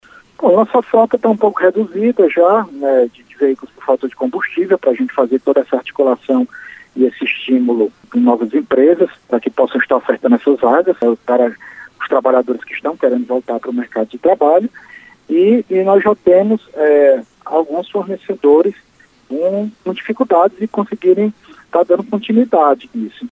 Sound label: sine